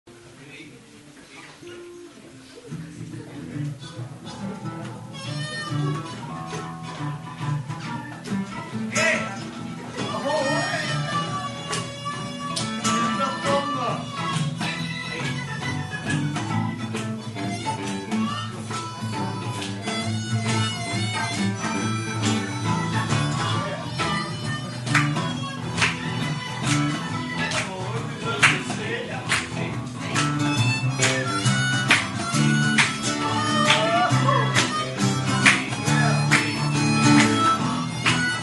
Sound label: blues jam